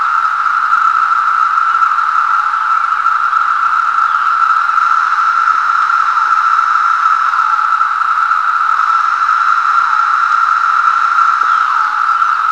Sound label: sdecimchor, Periodical Cicadas